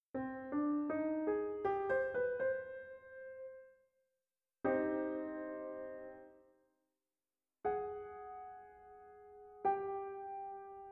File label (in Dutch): gealtereerd, eerst een kort melodisch fragment en de tonicadrieklank, daarna de twee samenklanken zoals genoteerd